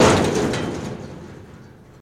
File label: Jeep Crash On Metal, car crashes